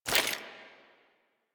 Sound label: Generic Footstep; Warframe; Dagath